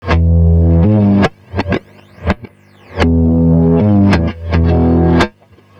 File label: FX DIST; FX DISTO